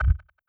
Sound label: system browse